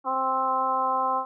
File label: trumpet